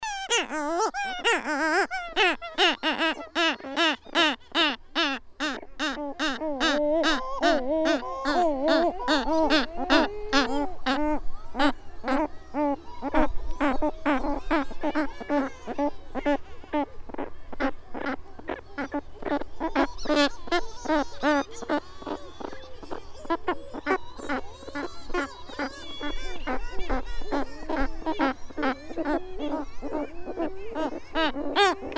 Större lira

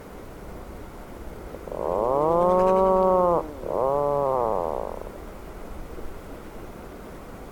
Lunnefågel

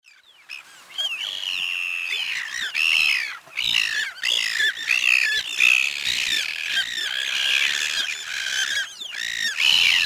Ismås

